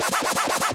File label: gambling loop; scrachy; Back to 70s; wazdan